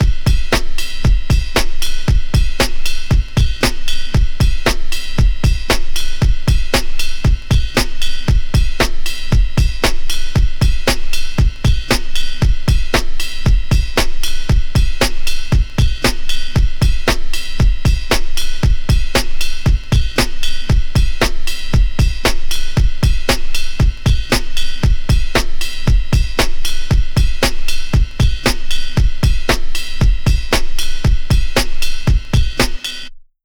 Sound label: 116 Ridey Clacker, Breaks; Lockdown Breaks